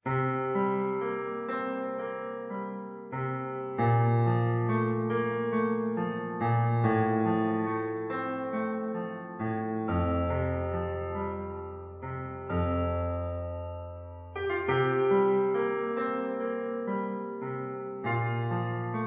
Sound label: new age